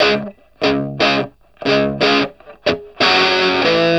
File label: Vintage Blues Guitar, 60, CS; USB Soundscan vol.22; AKAI